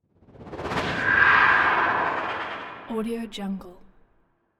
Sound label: monster growl woosh